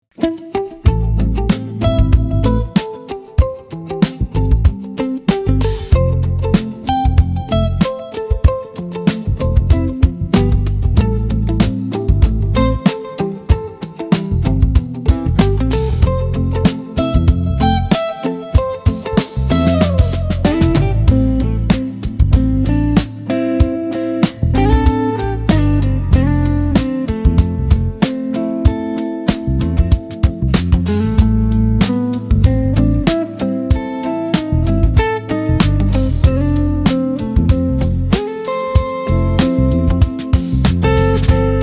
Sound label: jazz warmth